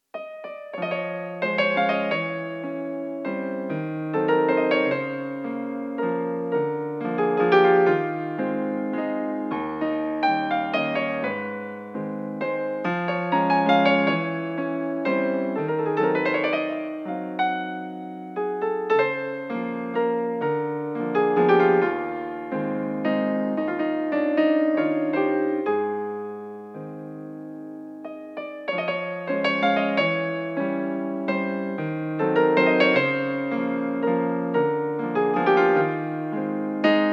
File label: chopin waltz